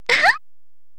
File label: uhu